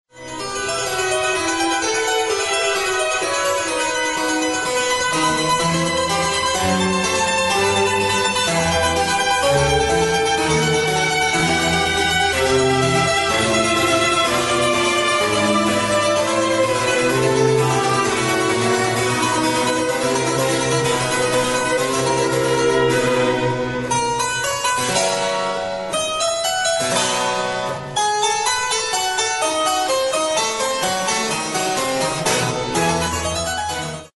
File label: Cembalo Concerto A Maj; Dittersdorf